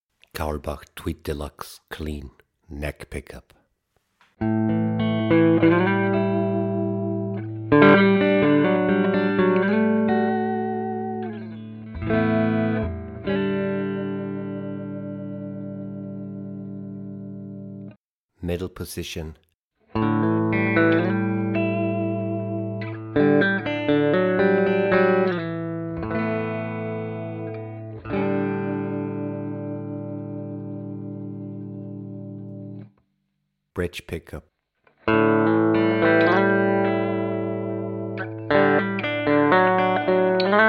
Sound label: tweed del clean